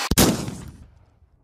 Spas

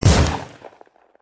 Sawed Off

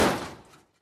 Metal Solid Impac Hard

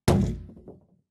Rubber Tire Impact Hard

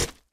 Stab

